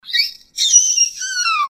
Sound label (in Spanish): Chillido; Banco de Sonidos - Facultad de Art